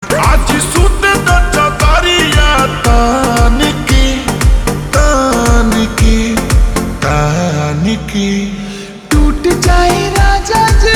Ringtone Slowed Reverb

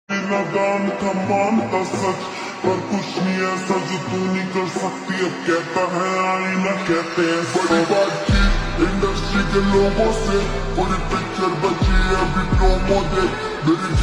Slowed Reverb Ringtone

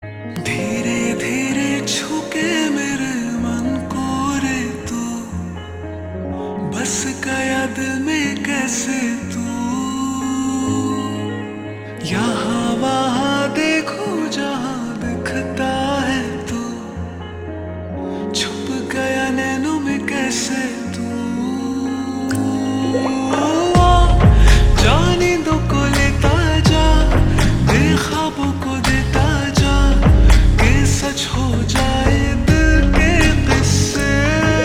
Ringtone Slowed Reverb; devara

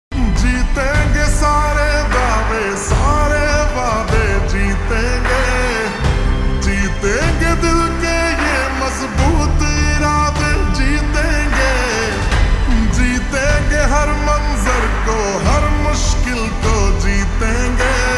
Ringtone Slowed Reverb

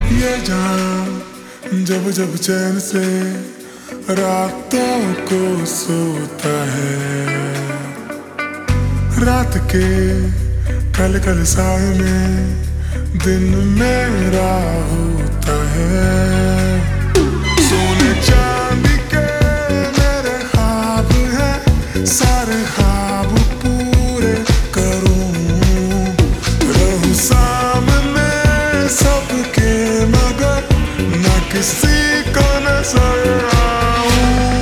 Slowed Reverb Ringtone

